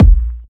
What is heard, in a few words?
smooth bottom end steel kick drum sound a sharp key